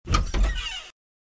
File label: door open sound